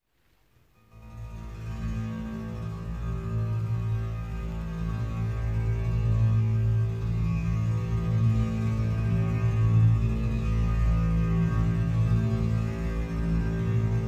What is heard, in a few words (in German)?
Klangliege